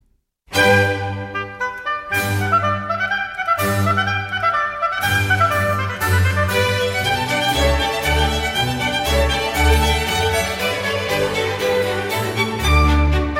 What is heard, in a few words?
Sinfonia for Oboe, Strings and Continuo in G; Domenico Scarlatti; Sinfonia #3